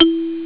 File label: marimba; chipmusic; sonix; Rise Of The Dragon